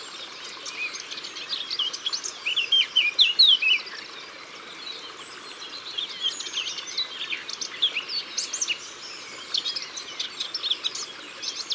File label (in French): Fauvette A Tete Noire; Concerts Naturels De l Ardeche